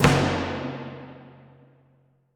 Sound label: Music Generic Marker Perc